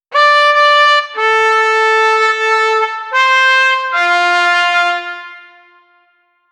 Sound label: sound of trumpet of victo; brass instrument